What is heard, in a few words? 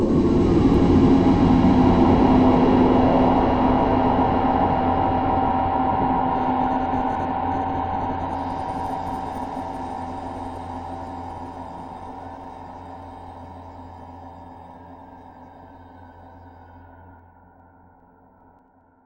ambient; Sonic Foundry (Sony Creative Software); Ugly Remnants VOL-2; UR 2 FX (Long)